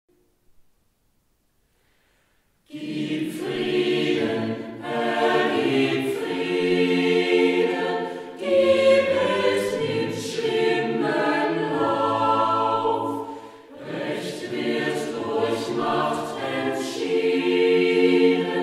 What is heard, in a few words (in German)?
Kammerchor; Gib Frieden, Herr, gib Frieden